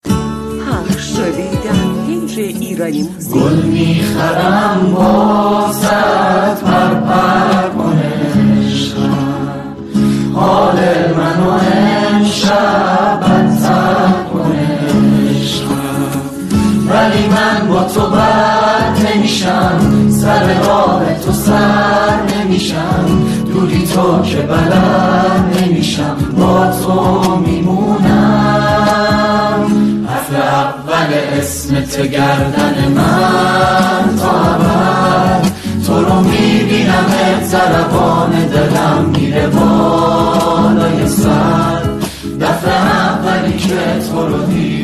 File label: Mass group performance with guitarr